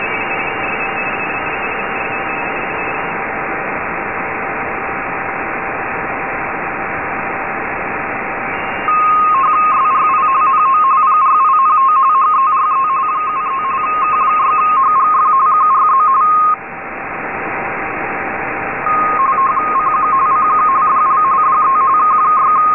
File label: rtty 2000Hz